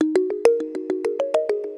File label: Tongue Mallet Echo; Melodic; Ambient-Melodics-II